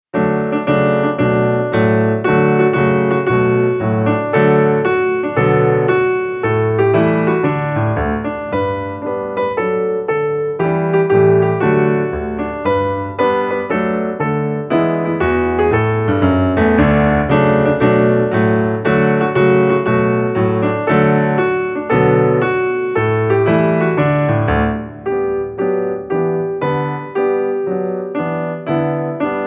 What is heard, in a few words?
Somos marineros solo piano